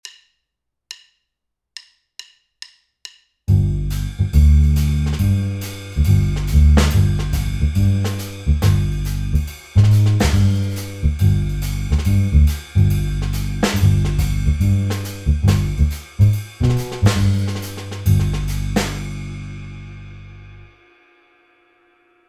G7b9 минус без фоно

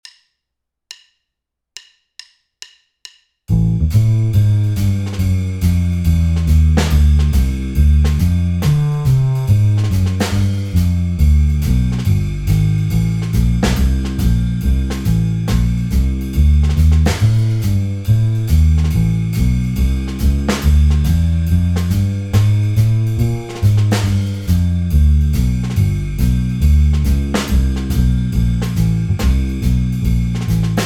Am7b5 Dm7b5 G7b9 Cm6 минус без фоно

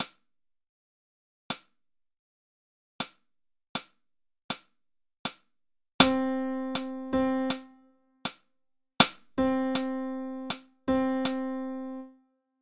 Пример четверть с точкой

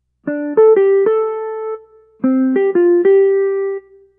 Секвенция; Анатомия Музыки

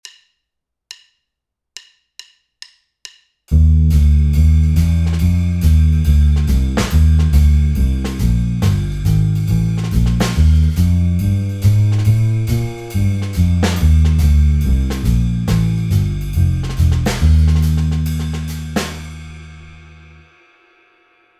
Ebmaj74 минус без фоно

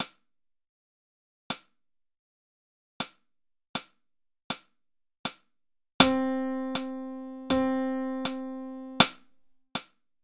Пример половинные

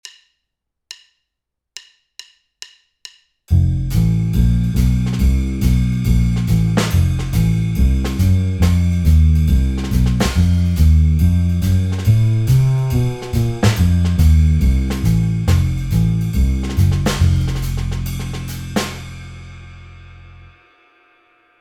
F7b9 минус без фоно

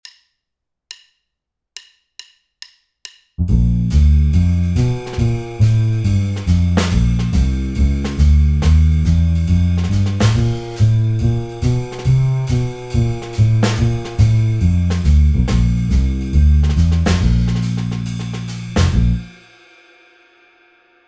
Bbmaj74 минус без фоно

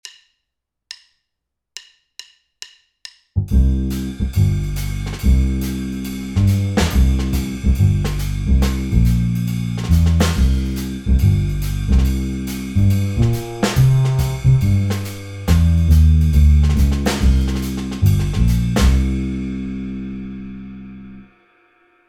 C7b9 минус без фоно

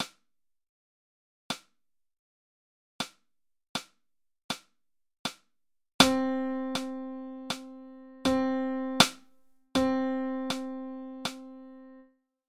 Пример половинная с точкой

